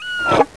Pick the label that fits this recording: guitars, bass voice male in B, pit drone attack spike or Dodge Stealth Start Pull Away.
pit drone attack spike